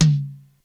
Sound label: TOM-H; Crypton - DrumBase5000